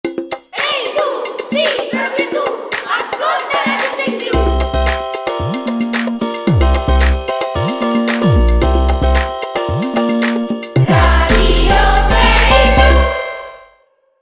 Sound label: jingle